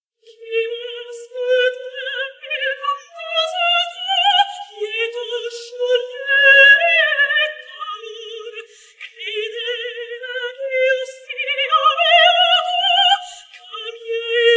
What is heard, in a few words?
sop sep